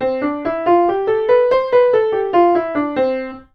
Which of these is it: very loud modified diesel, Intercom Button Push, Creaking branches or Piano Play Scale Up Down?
Piano Play Scale Up Down